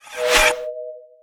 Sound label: ui sweat banner hide